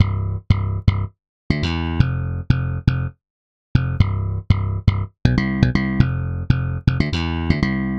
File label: bass